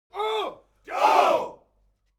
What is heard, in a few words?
human crowd military shout oh; smartsoundfx